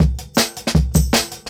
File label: Jungle Breaks; Original Jungle Breaks